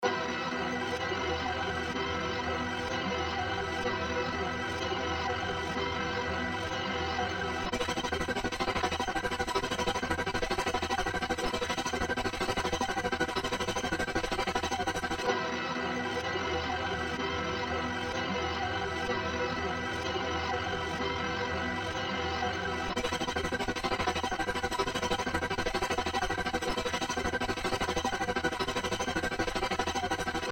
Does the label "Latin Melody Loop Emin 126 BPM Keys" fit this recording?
Yes